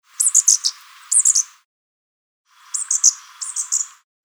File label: Fairy wren versus brown thornbill